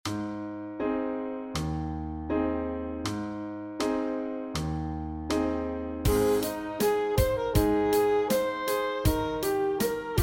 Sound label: Sax Study